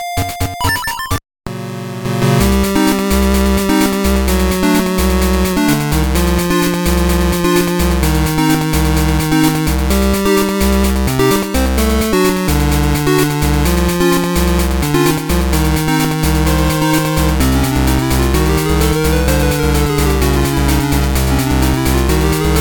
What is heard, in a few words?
boss lower octave; The minish cap